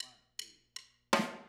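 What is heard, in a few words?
Intro Overhead stereo, drums, 162 BPM; Drums from the Big Room The Mixes; Take Your Toms Off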